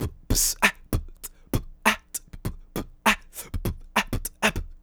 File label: alpha, Beatbox; Drum loops